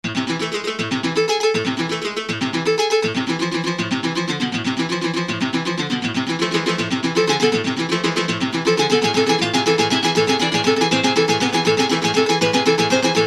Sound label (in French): mandoline